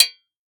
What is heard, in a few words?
Impact Shovel Hit By Wooden Stick